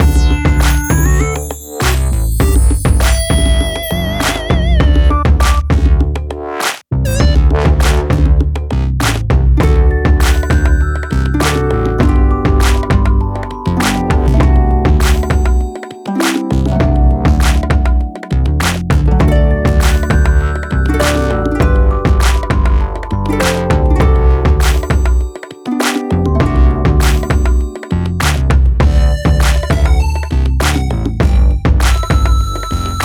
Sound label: Menu Mainmenu; Abraca Imagic Games